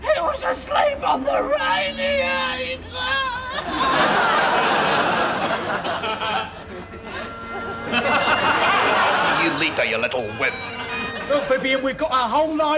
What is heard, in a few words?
bagpipes; The Young Ones